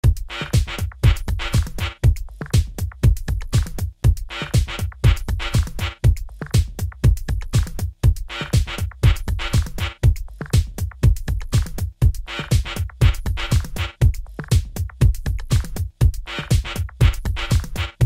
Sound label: Disco Music; Lego Marvel's Avengers